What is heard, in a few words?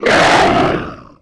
Heat Creature Hurt Growl; Mars Sortie